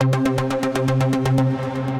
ARP Hatter, Synth Rhythmic; Ableton; Factory Packs; Build and Drop

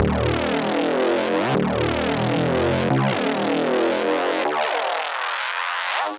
G Minor 155 bpm, Bassline; MIDI Clips; Tonal; Skitter and Step; Ableton Factory Packs

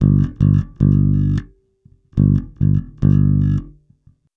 110 Pop Basic Bass G, E-Bass; Pop Rock

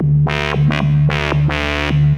110 NuJazz Synth D

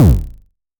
modular tonal, kick; Ableton Foundation FreePacks

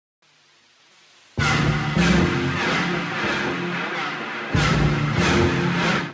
Dream Pretense A# Minor 150 bpm, Pads; MIDI Clips; Tonal; Skitter and Step; Ableton Factory Packs

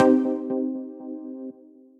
Blue Chords, Synth Lead; Ableton; Factory Packs; Punch and Tilt

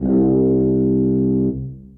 C Tuba Solo Legato; Ableton; Orchestral Brass

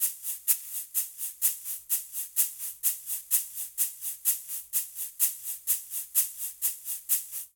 Cha-cha-cha Shaker 127bpm; Latin Percussion; Ableton; Factory Packs

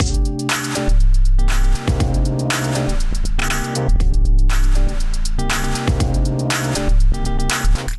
Kit, Drums; Gotta Feel Kit; Ableton; Beat Tools

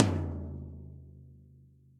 Tom Floor; Drum Hits; Standard Hits; CopStyle; Ableton; Factory Packs; Session Drums Studio

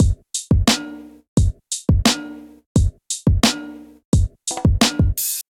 MPE Kit 87 bpm, Golden Era Hip-Hop Drums; MIDI Clips; Moonroof; Sound Oracle

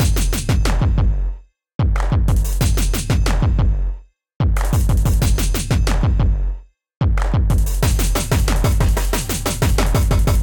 Kit, Clips; Kindified Kit; Ableton; Bomblastic

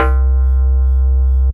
Bass Fake Live Bass; Drive and Glow; Ableton; Factory Packs

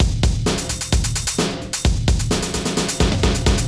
HipHop Beat 90bpm, MIDI Drum; Ableton; Factory Packs

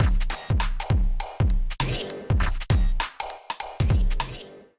Kit groove 100 bpm; MIDI Clips; Undercurrent; Ableton; Drum Essentials

